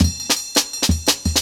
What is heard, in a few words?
168, Jungle Breaks; Original Jungle Breaks